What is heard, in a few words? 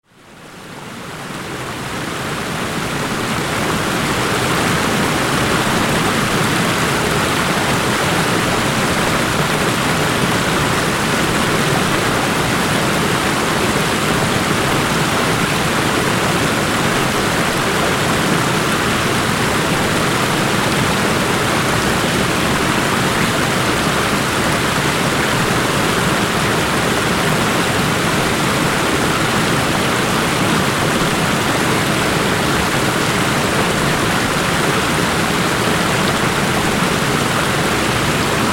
waterfall; stony brook state park